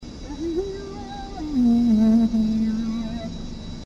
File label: humming; monitor